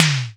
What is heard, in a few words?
Tom, Percussion; Vengeance Samples